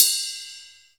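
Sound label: Ride, Percussion Hits; Zero-G Jungle Warfare 1, 2 & 3; 90 s Sample CDs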